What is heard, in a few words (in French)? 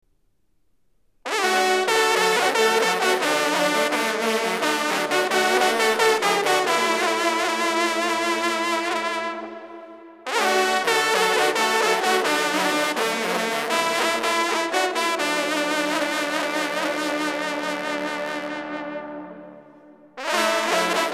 TRIO, trompes; Dupuytrem La